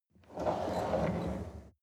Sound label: chair wheel roll on floor soft